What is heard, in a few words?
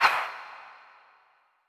ghost swish, halloween